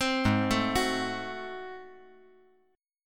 Ab 7th Flat 9th, chord; Ab7b9 arpeggiated; JGuitar

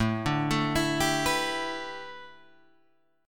A 9th Suspended 4th, Guitar Open G; 7,7,7,9,8,9

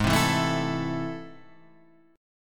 A Minor Ab, guitar; Am/Ab strummed; JGuitar

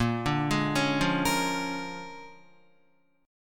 Bb 7th Sharp 9th Bb Guitar, chord; 4E,4A,5D,5G,5C,6F; Bb7#9 arpeggiated; 6,5,6,6,5,5; JGuitar

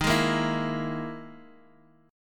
E Major 7th Flat 5th

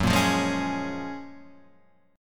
Gb Major 9th, down, Guitar, Half Step Down

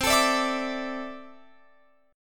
C 6th Add 9th C down Guitar Open F; C6add9 strummed

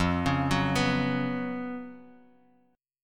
Gb Major 7th Suspended 4th F

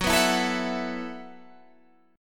E Suspended 2nd Suspended 4th F sharp

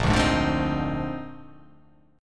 A sharp 11th

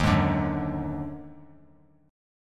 Eb Minor Major 7th Double Flat 5th; JGuitar

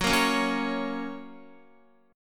F sharp Major F sharp, Guitar; strummed; 4E,4A,5D,5G,5C,6F; x,9,8,6,6,8

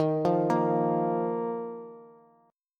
Eb Suspended 2nd, Bass; Ebsus2 arpeggiated; JGuitar

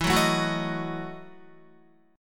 E Major 7th Suspended 2nd Suspended 4th E, Guitar; strummed

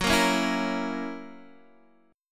Gb 7th Gb down Guitar Open D, chord

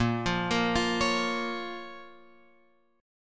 A sharp Minor, down, Guitar, Open F; arpeggiated; JGuitar

